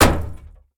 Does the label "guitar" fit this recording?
No